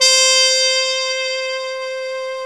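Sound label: MUTE trumpet; X-Wing; X-Wing Patches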